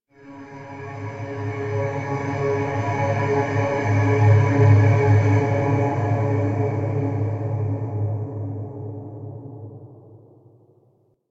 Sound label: Rand Chant H; Warframe; DE; Hubs; Syndicate Arbiters; Tenno